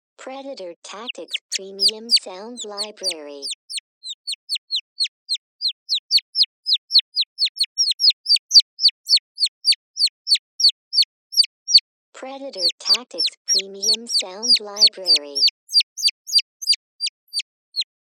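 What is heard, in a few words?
Turkey Poult Distress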